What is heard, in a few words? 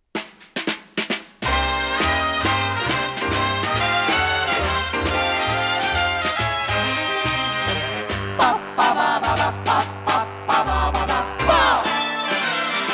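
vokalnii kvartet, vocal quartet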